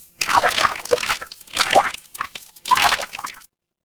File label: water swirl